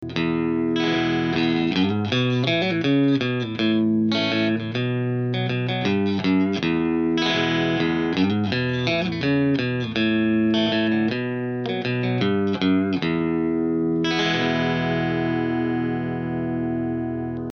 Middle Clean, pickups

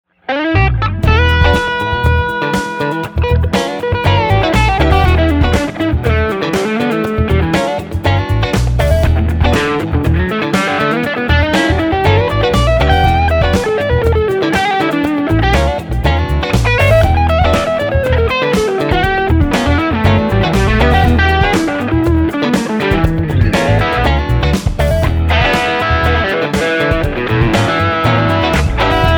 3rd Position Clean Rhythm Dirty Lead; 1963 Epic Series Stratocaster Pickups

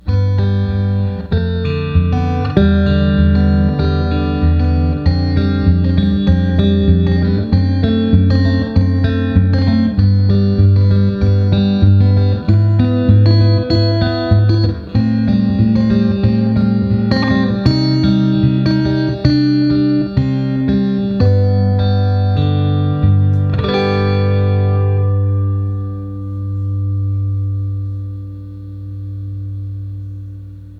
neck, pickups

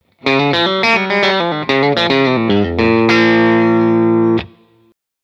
Tri-State Bridge Tap, pickups

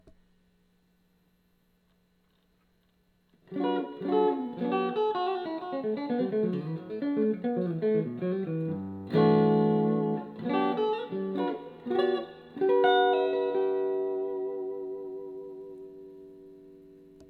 fat man tele neck, pickups

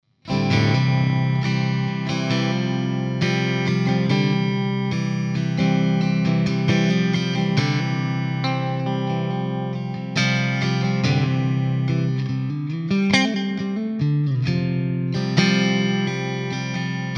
jazzy

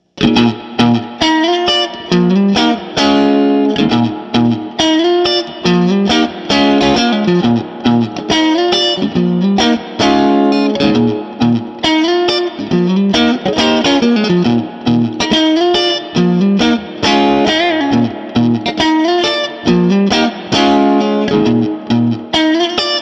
Epic 1955 Middle 'ish, pickups; Klein

